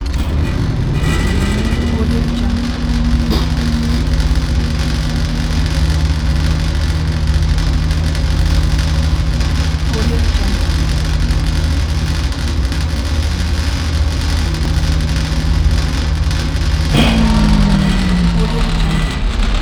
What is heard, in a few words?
chattering metal machine